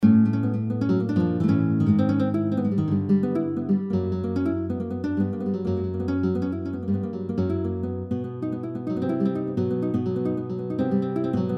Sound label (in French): GUITARES EN DEMI TON